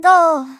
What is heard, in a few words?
Doh; Teto; SM64 CO-OP; Triple Baka Pack